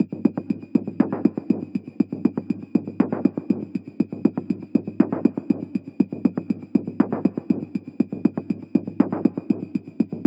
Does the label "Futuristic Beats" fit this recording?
Yes